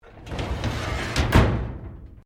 Drawer Metal Filing Cabinet Close; Various domestic drawers; Household

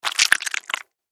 Horror Squish Suction Blood; Monster Sound Effects; Monsters (Sound Effects:Horror); SFX Bible 6

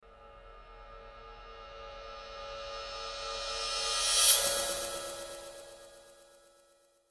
Horror Cymbal Reverse Swell Delay; Scary Tone; Blastwave

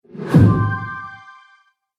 Trailer Motion Picture

